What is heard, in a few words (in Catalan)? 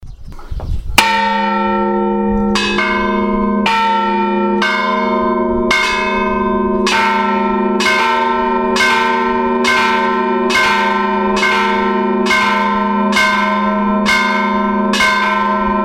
campana